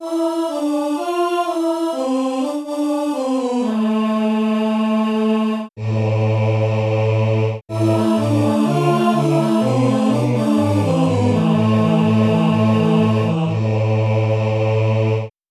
echo drops

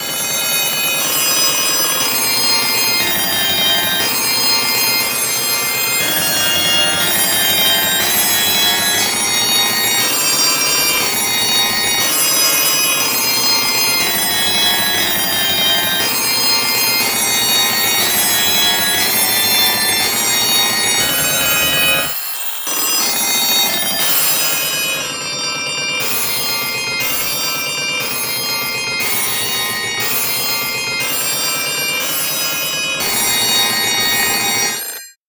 telephone

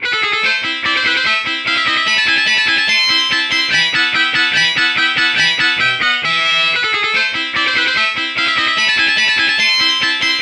overdrive guitar mozart samples

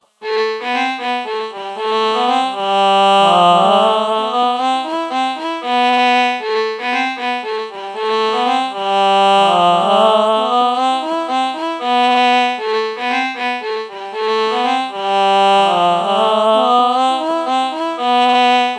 ah violin melody morph low

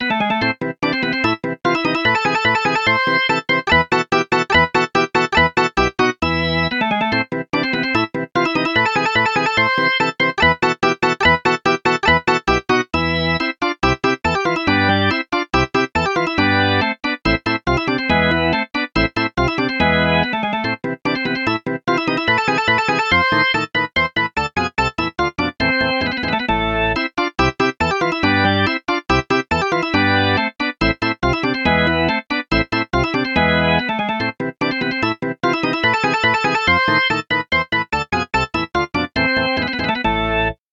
rock organ

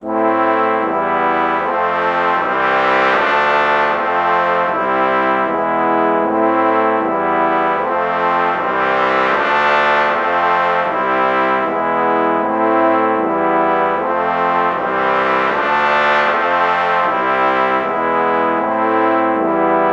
bass trombone trumpet false chord morph

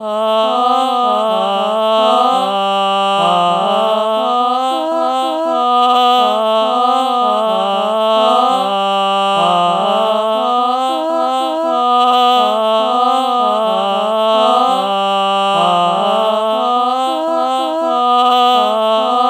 ah ah melody morph low

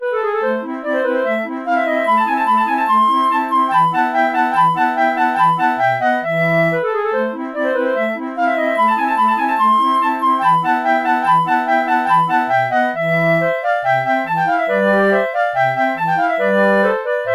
flute

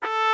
oh trumpet lpc true melody morph

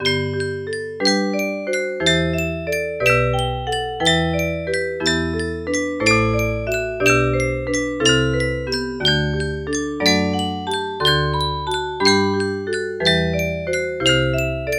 music box

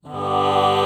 alto flute ah morph

